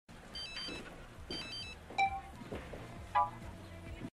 Text Tones

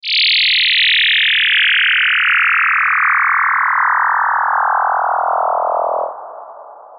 Sweep

